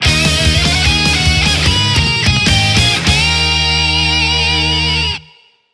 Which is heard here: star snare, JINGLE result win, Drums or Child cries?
JINGLE result win